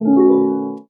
harpie, String; Notes

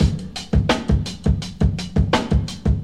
hick clark, Drum loops Boombap

